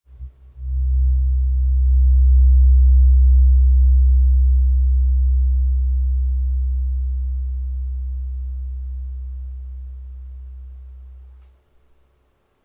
64hz diapason therapeutique

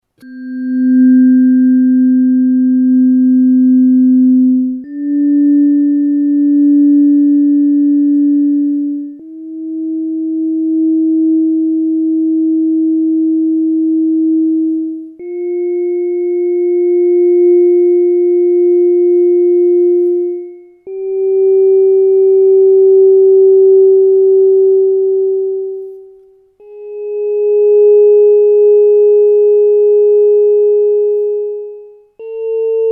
set diapasons therapeutiques 4e octave harmonique

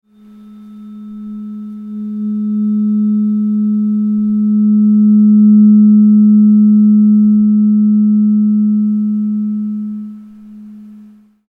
diapasons neptune 211.44 Hz

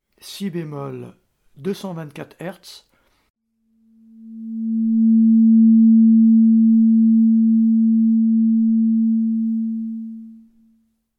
Si bémol 224 Hz diapason

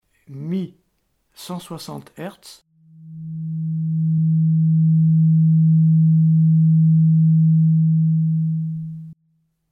mi 160 Hz diapasons

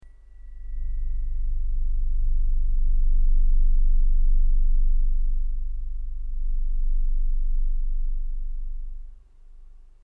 24 Hz G sol diapason thérapeutique

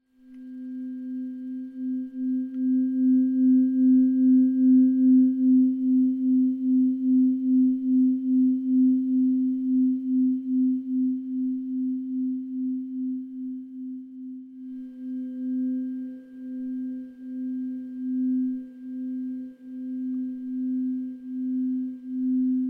Ondes delta 1 Hz et 2 Hz diapasons

